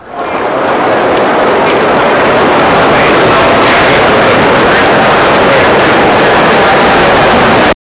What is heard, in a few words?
hubbub